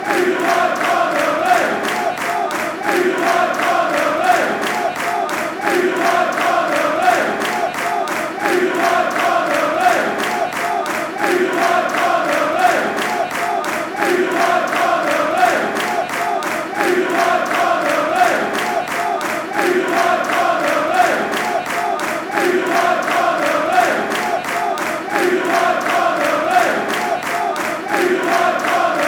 barmy army, football songs and soccer chants from England